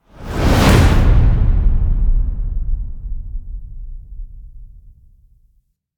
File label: Tank Finisher; Warframe; NineteenNinetyNine; Cinematics